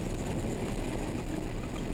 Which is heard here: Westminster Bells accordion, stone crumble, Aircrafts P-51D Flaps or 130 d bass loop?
Aircrafts P-51D Flaps